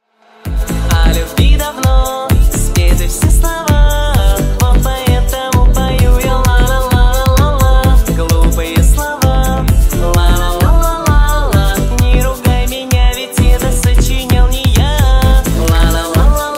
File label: remix